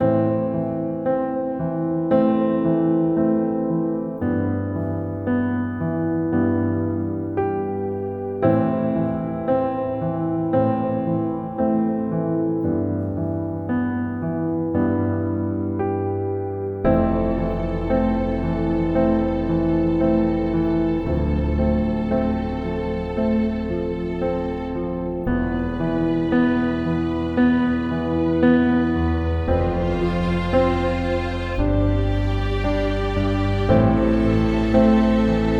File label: Lows And High Sad; Narrative Mind